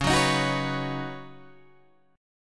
Db 7th Suspended 2nd Suspended 4th Db down Guitar Open G; JGuitar